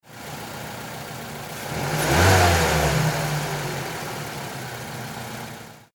Car Mazda 3 Low Rev; Power Car sounds

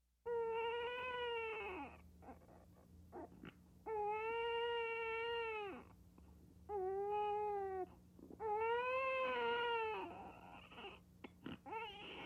Baby Crying; Babies; Voice; recorded in a custom built sound booth; Bobby Cole

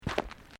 Footsteps Boots Gravel Fine Step; Footstep Foley; Footsteps (Sound Effects:Human)

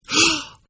Gasp; Gasping; Sound Effects:Voice; Partners In Rhyme

